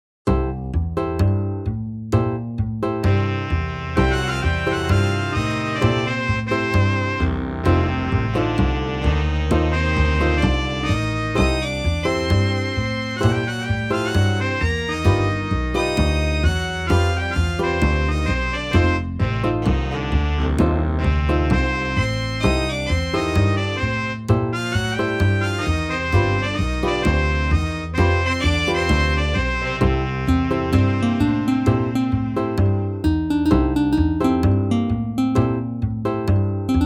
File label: MIDI blues